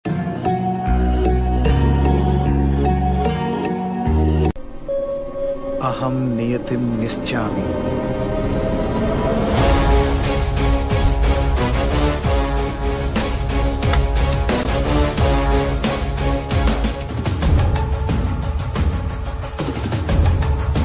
movie bgm ringtone, telugu movie bgm; nithiin

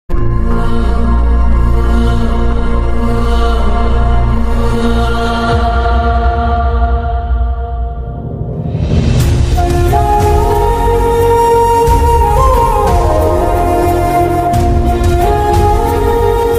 emotional bgm ringtone; hi nanna; nani

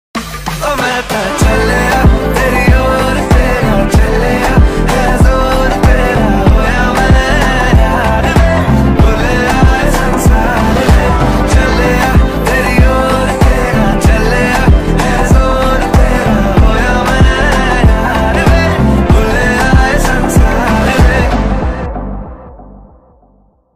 song bgm ringtone; Jawan

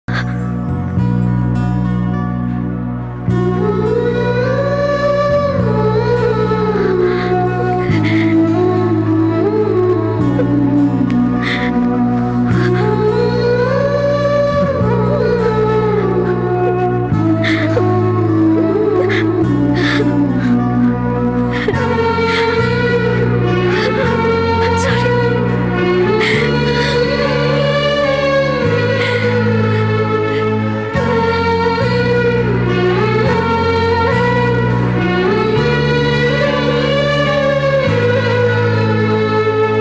heart touching and sad love bgm music; sontham movie

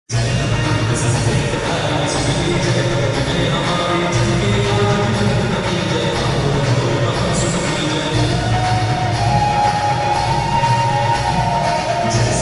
super fast song ringtone, devotional; bajrang baan; bhajan; bajrangbali; hanuman

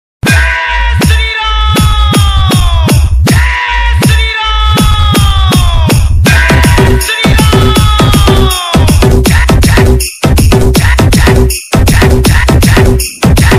dj remix mobile ringtone

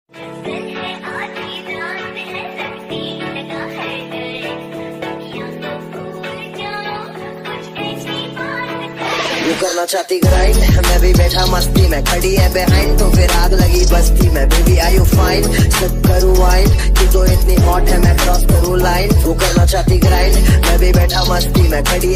love ringtone; valentine ringtone

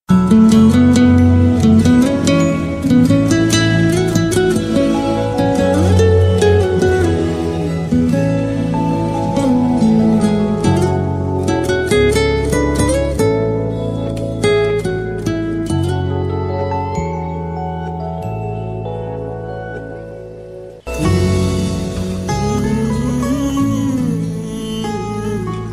tamil ringtone love bgm tamil romantic; movie ringtone; tamil ngm ringtones

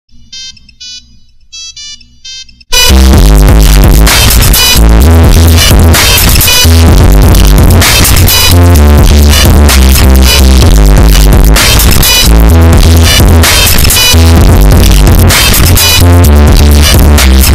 nokia ringtone earrape

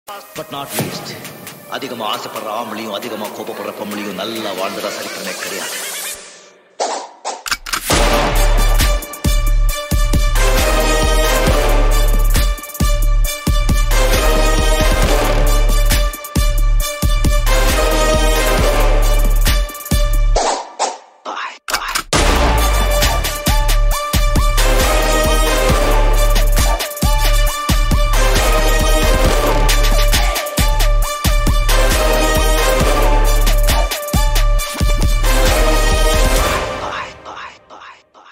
mass bgm, theme music; padayappa; rajinikanth

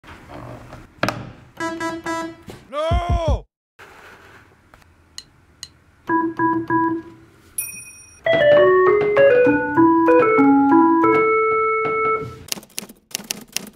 nokia ringtone synthesizer piano arturia retro

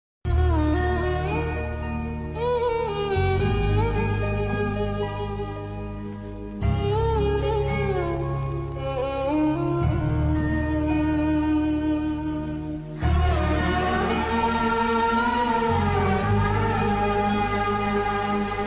emotional bgm ringtone telugu sad bgm ringtone; tulasi movie

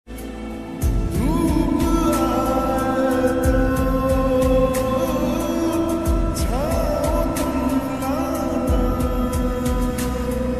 love song ringtone

